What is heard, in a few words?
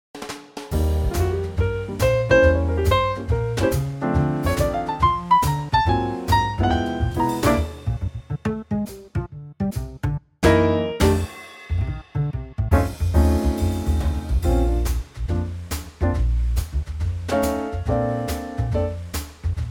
Trio Bb; key - Bb - vocal range - F to C; Superb Trio arrangement of the main theme (verses not included) from the movie Cabaret